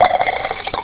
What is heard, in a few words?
fill jug; openrsc